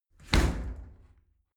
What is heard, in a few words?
shower slide door close hit heavy